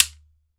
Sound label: SHEKERE, African Percussion